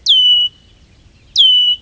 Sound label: Whistle